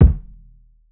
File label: Kick; Sleep